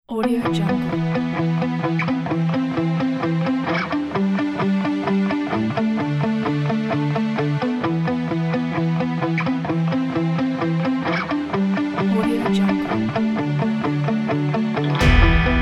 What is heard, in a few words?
action motivational